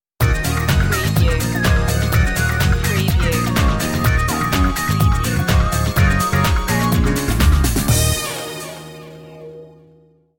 chic stinger

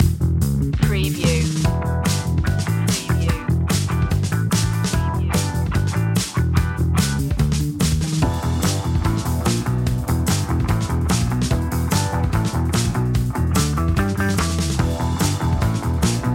cool attitude upbeat

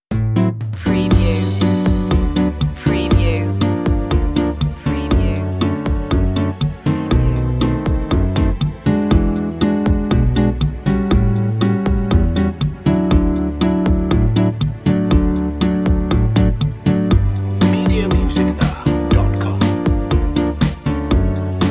happy

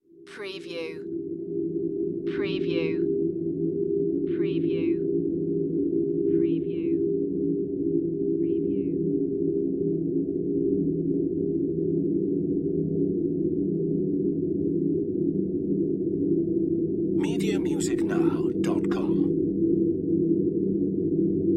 Drone Ethereal; Sound Effects Bible

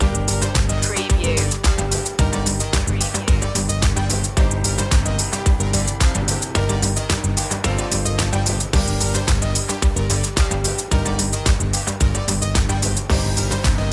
corporate machine loop end; media music now

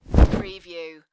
Monster Wing Flap

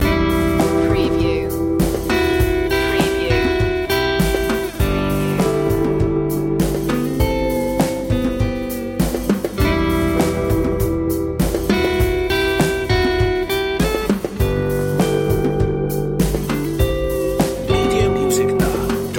spaces loop

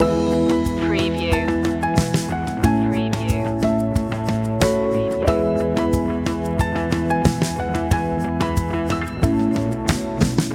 rumble in the jungle loop

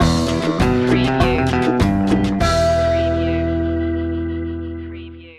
latin stinger

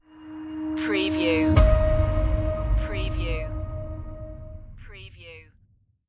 dark power stinger

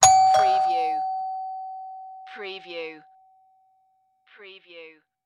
Door Bell Ring Close

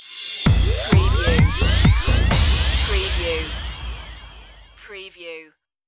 laserbeam stinger

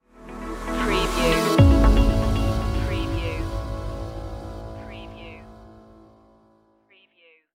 positive flow stinger

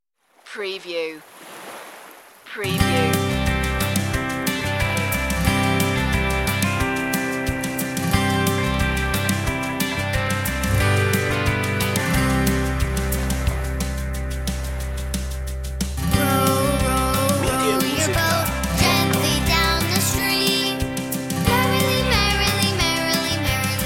child solo vocal

